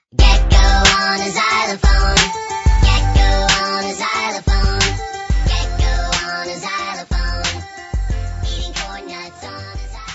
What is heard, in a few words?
Xylophone